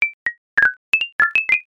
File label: raindrop